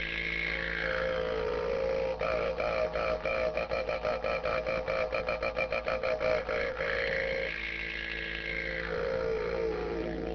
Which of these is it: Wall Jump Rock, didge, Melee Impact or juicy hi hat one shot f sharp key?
didge